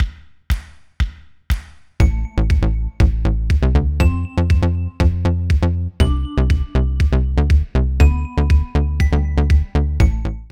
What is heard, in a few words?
fm quick bass